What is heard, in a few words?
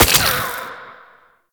Venom Burst; Warframe; Saryn